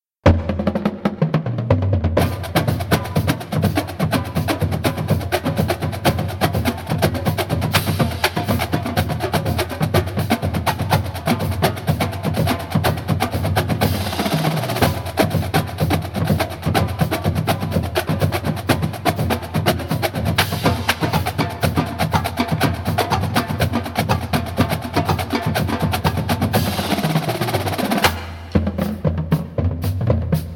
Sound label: Drumline, marching band; Cosmo Drumline